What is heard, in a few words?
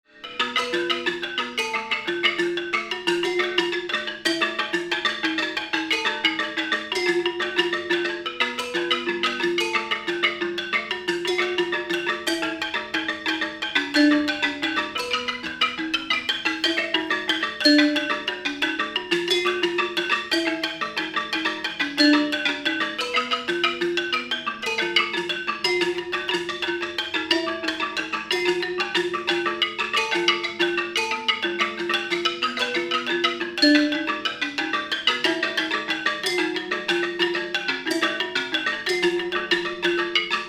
bamboo Gamelan